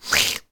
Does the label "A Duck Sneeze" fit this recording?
Yes